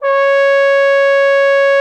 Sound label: C#5, TROMBONE; Xsample VOL-3